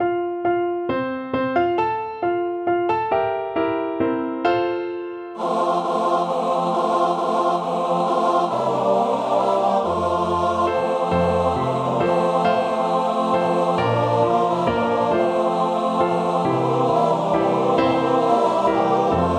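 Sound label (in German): Männerchor vierstimmig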